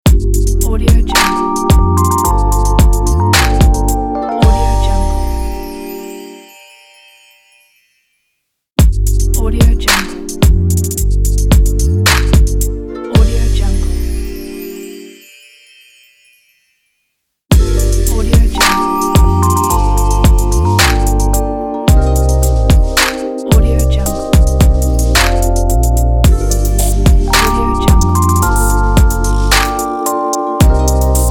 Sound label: relax logo